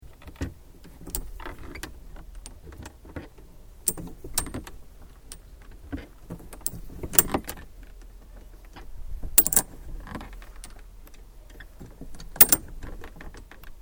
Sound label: Playground Chain And Tyre Swing Operating; Various playgorund equipment